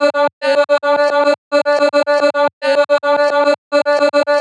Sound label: chopped vocals female Dm 109; reverb